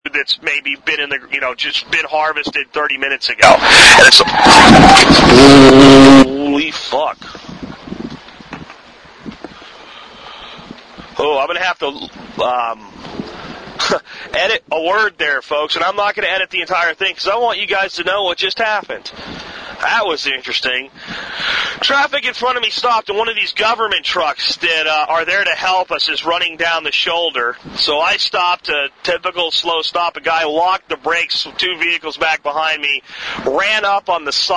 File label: almost crash